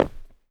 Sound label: rock, steps; Terrarum